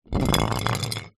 household glass jar containing water short roll concrete